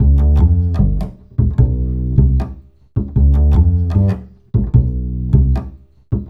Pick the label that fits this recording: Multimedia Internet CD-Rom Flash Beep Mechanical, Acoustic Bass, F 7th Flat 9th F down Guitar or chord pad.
Acoustic Bass